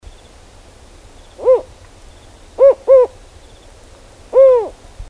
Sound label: Spotted Owl Strix occidentalis lucida